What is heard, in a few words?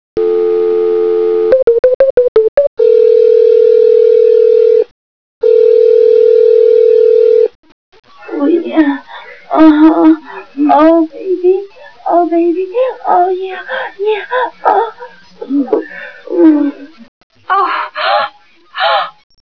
phone call